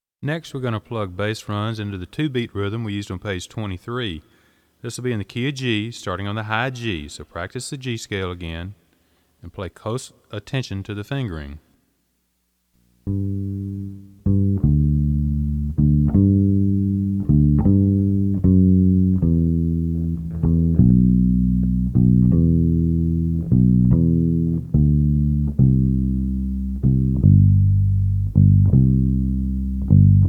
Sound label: Walking Two Beat Slow